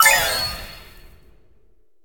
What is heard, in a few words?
candy Fall High; fairyhansel